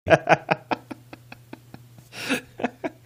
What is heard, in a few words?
tail end long laugh